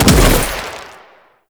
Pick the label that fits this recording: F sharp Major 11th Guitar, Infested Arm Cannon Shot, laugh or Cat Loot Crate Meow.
Infested Arm Cannon Shot